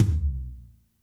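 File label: TOM soft; Zero-G; Chemical Beats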